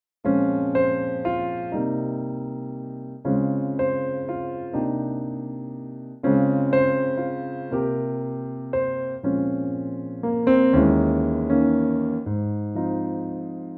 Ab piano

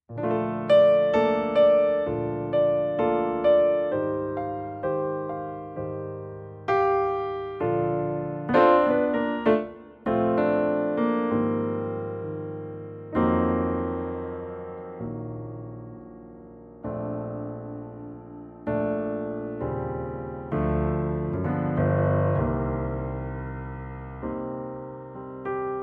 piano Bb; key - Bb - vocal range - Bb to C

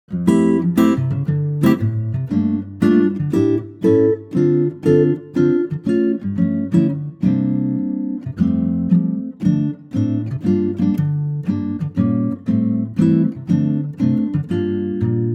Ac Guitar F

